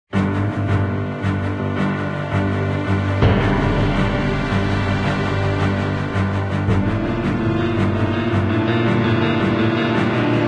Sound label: Tension